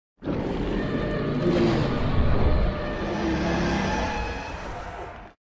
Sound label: Death Roar; animals